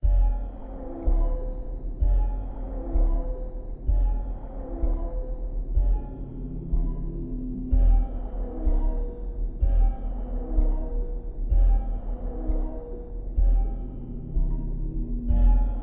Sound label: Butler Music